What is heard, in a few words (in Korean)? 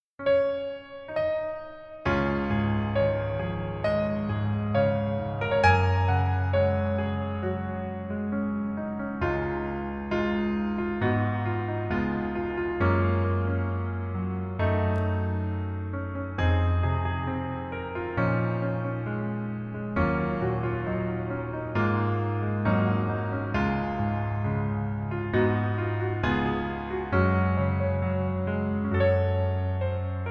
Piano ver, 멜로디MR, -1키